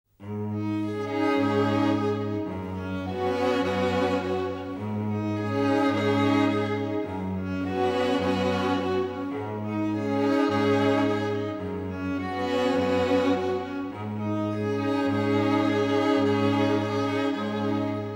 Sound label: lonely version, with Piano, mellow unison; Neon Genesis Evangelion; Evangelion S2 Works